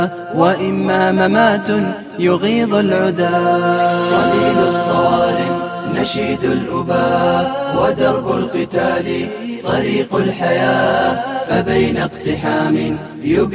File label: game over